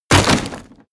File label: break wood small